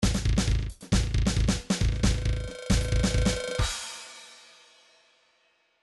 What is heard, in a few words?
trance midi loops fills; Newtronic Trance Constuction Kit; Fire